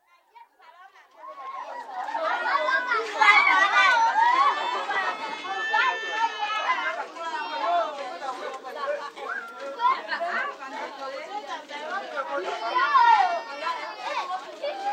Zaire: Gabadalit, small noisy crowd, with screaming children & footsteps; Outdoor Sound Effects; BBC